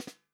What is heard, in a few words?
FUNK SNARE CHROMATIC, REAL DRUMS